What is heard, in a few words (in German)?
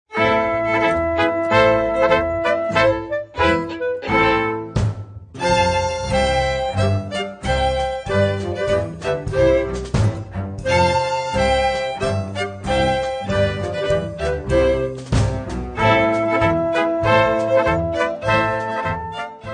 Topporzer Kreuzpolka